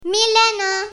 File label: wrong; grade4; how many how much